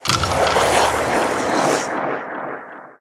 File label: Freeze; Puzzle Chronicles; PS3; PSP; X360; SFX SPELLS